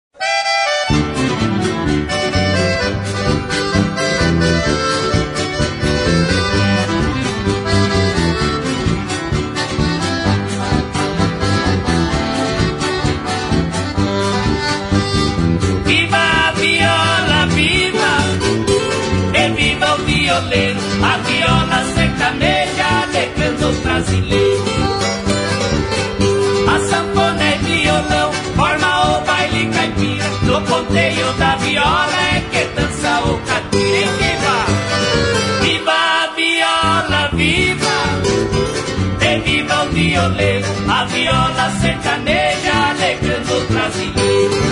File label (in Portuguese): Sertanejas Classicas